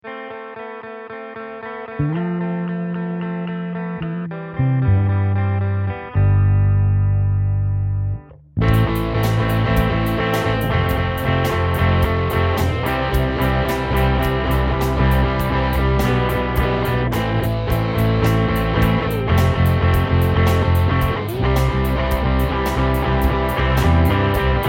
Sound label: 4/4 rock finished performance